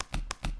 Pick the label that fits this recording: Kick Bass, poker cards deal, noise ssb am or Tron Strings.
poker cards deal